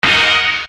Stab; Sonic Foundry (Sony Creative Software); Infinite Soul VOL-1

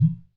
UDU, African Perc; E-MU Formula 4000 Series Vol. 4; Earth Tones

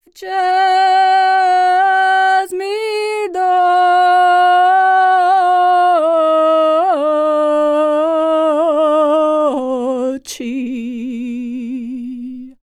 SERBIA, Vocal World Voices SERBIAN

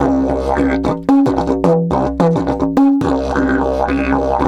108, DIDGE 108BPM; Big Fish Audio - Didgeridoo and Other Primitive Instruments

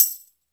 TAMBOURIN; AKAI S-Series CD-ROM Sound Library VOL-5

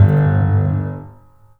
THUD, The Piano; InVision Interactive

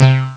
Bubble, Amiga Soundtracker; ST-XX Amiga Soundtracker Sample Packs

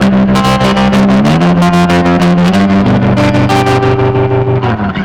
Guitars B, 95bpm, analogue circuit; musicradar

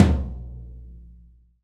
TOM TOM, Real Kit

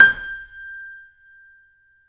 G 5, YC7 Piano mf

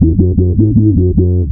WHA BASS; LOOPBASS KIT; AKAI; Big Beat Samples & More

